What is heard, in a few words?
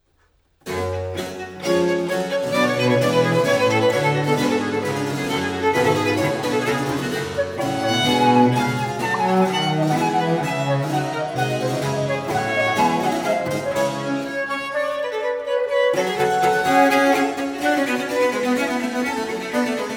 Trio Sonata in G Minor for flute violin and basso continuo Allegro; TWV 42:g13